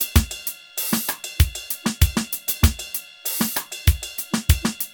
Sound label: Drum Bombo Groove